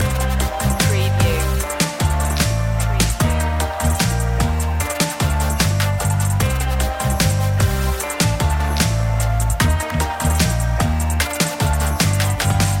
urban challenge loop, An edgy and driving track, with powerful trap drums; urban rnb hip hop